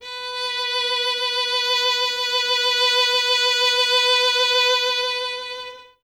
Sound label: violin, Solo Instruments; Miroslav Vitous - Solo Instruments VOL-2